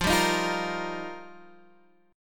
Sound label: F 7th Suspended 2nd Suspended 4th Guitar Half Step Down, chord